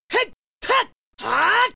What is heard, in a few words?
Attack; Link